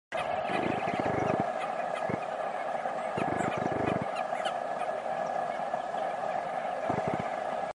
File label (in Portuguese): canto do alca de crista